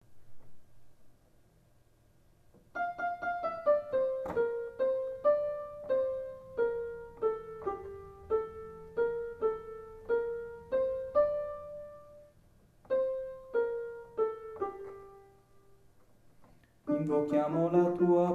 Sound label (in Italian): Bassi